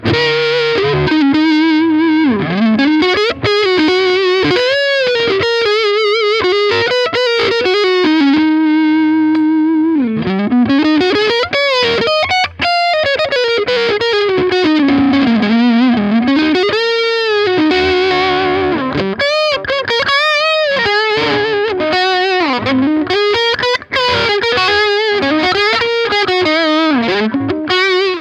Alle Pickups crunchy

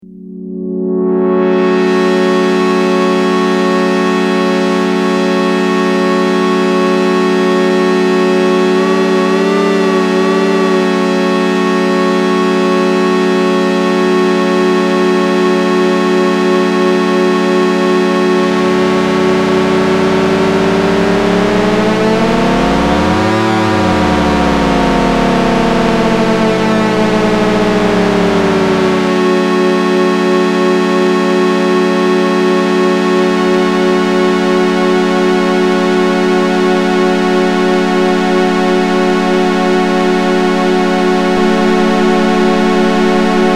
Arpeggiator Polymode mit X Mod

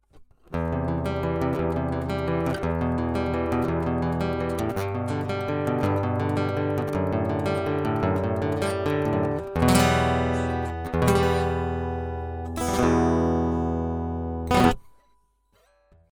Akustik Git direkt

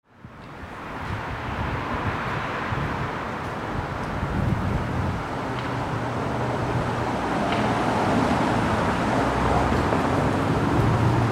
strassenatmo; mackie em chromium

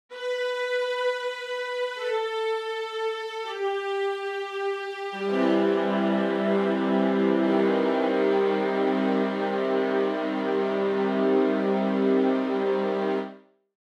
Streicher unprocessed

